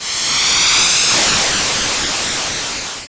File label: Explosion